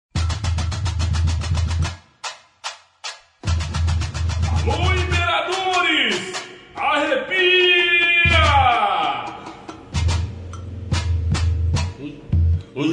samba enredo

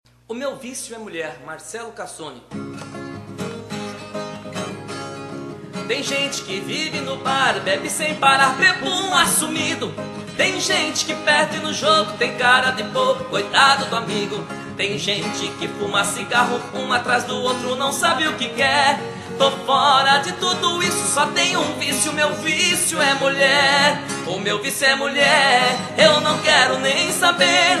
mulher violão voz

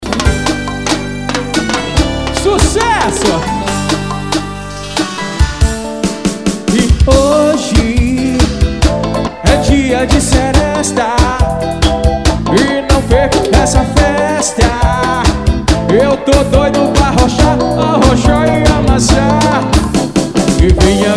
arrocha das antigas ao vivo

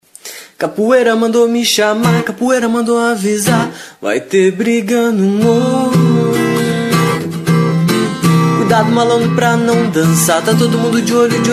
samba do morro

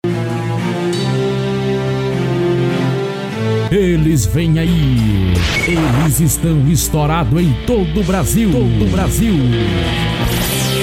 forro

